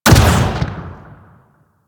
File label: Spear Shot Hit; Weapons; Warframe; Tenno; Priest Spear